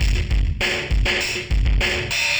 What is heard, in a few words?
ind dist